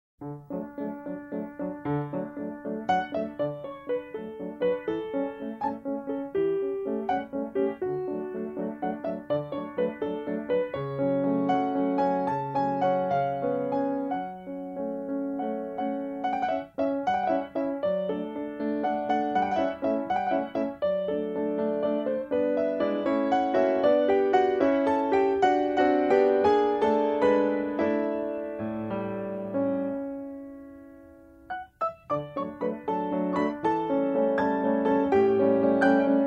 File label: Waltz